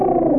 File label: attack; tha dolor